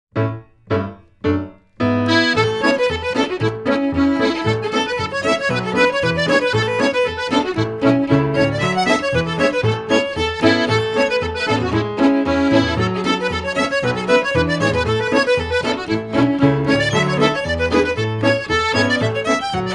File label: Fancy Hornpipe